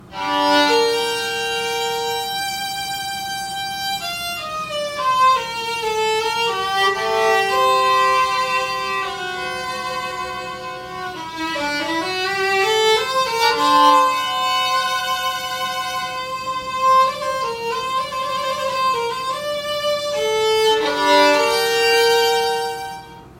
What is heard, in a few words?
playing violin